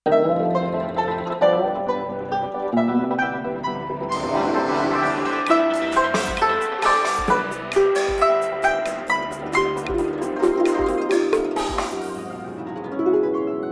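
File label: Chinese, alarms; ZTE Nubia V70 Max; Nubia Tour Chinese Fad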